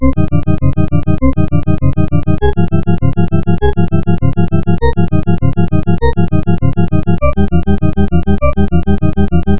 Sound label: Well Tempered; weierstrass